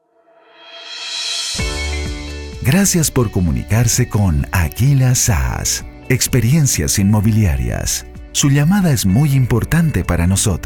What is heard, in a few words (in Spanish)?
HOLDING TELEFONICO